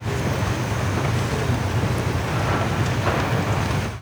building repaired event; Hearts of Iron IV Death or Dishonor

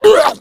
female boomer pain; voice; Left 4 Dead 2

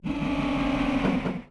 Motor Down; Sid Meier's Civilization 4 Complete; Beyond the Sword; Final Frontier; Units

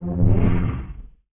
Grave Strike; Sid Meier's Civilization 4 Complete; Beyond the Sword; Units

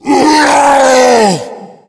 tank throw, yell; voice; Left 4 Dead 2

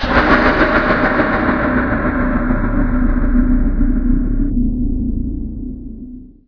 platform citadel ring; Garry's Mod; hl2

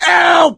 choke; Left 4 Dead 2; coach

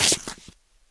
shoe scuff, footsteps; Left 4 Dead 2

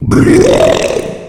male boomer warning, voice; Left 4 Dead 2

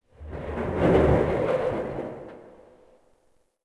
Goodyhut Metal; Ambient; Sid Meier's Civilization 4 Complete; Beyond the Sword

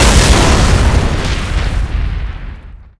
explode, hegrenade; weapons

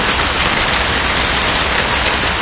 plant rain med loop; ambient, ambience

